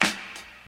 2000s clap D key, Royality free hand clap sound tuned to the D note. Loudest frequency: 2583Hz; percussion; single hits one shots